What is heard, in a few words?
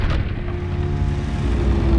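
Music Volcano 120; from dust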